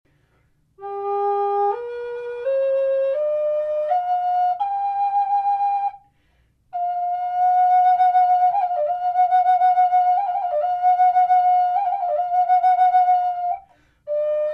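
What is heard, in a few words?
natural, wood flute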